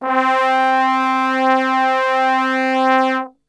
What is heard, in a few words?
C 2 Brass Super Section; Best Service; TRMBSTRGHT; 90 s Sample CDs